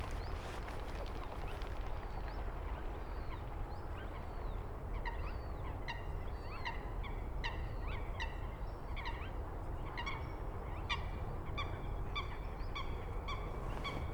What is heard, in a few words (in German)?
tuepfelsumpfhuhn